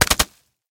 strekotanie pulemetnoj ocheredi

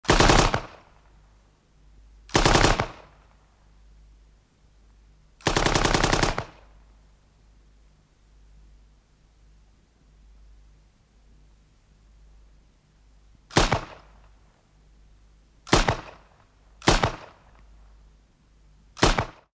pulemyot strochit

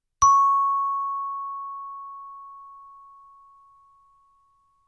zvonok

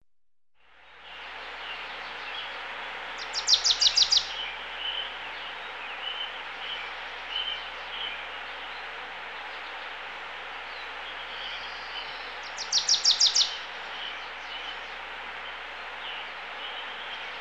raznie ptici obshaya atmosfera na fone ruchej

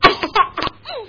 hihikane

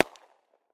odinochnij stuk kablukov

